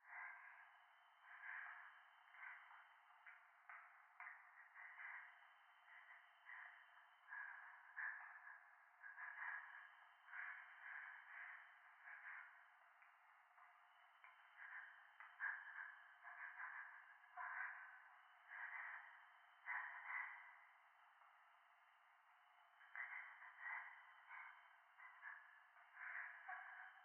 教会 右耳快感吐息 山奥

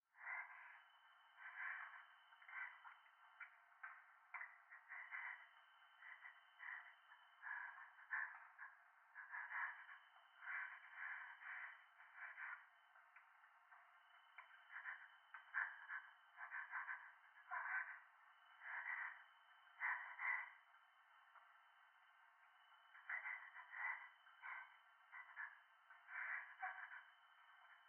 トイレ 右耳快感吐息 山奥

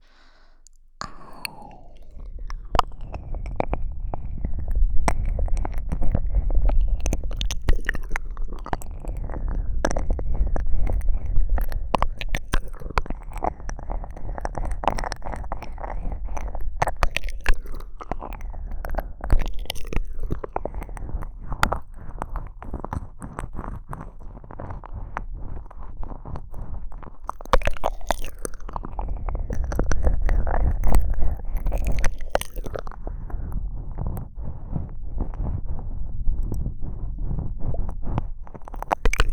耳塞ぎ舐めコース

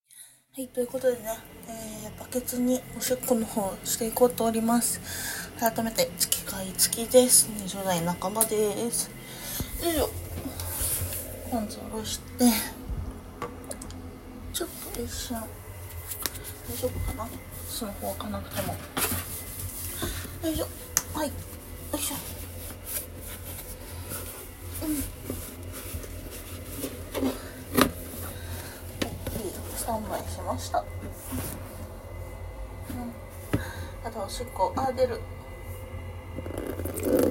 トイレで洗面器におしっこ

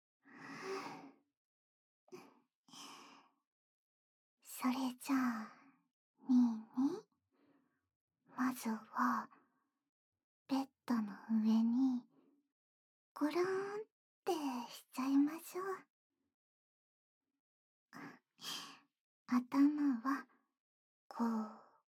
お耳のオイルマッサージ, ASMR

